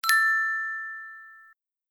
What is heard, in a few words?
tada